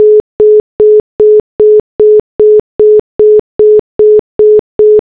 service; Asus P835

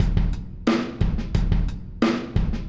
rock drums; miniBAE source code audio

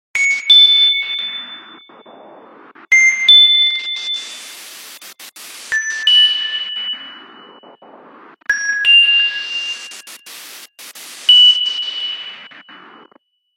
Tinkly; Nokia; 7390 Latin America; Ringtones

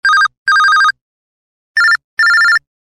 Simple Beep; GS390 Prime

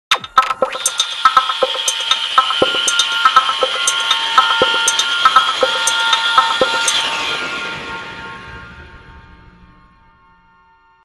Urgent, phonetones; Sony Ericsson; PlusFourSix; Thomas Tibert

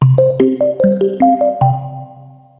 Fun Ball, notifications; Explay Cinema

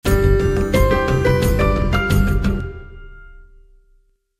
Ringtone, BlackBerry 9000 Bold

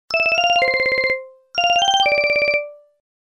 Pattern Beep, phone tones; LG GB258

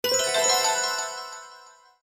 Japanese open; Nokia; Audio themes

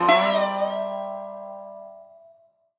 Twang, Natural Elements, notifications; Google; Google Sounds

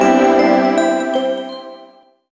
Notifier Entrance, BlackBerry 9000 Bold

